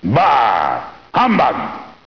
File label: Hum Bug